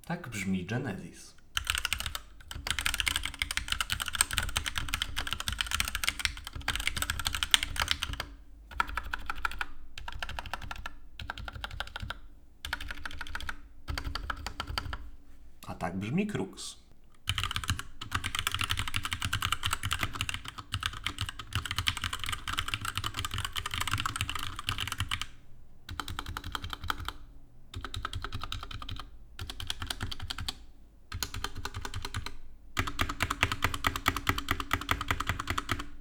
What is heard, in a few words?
Genesis Thor 660 Krux Neo PRO soundtest